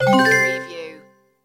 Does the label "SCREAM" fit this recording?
No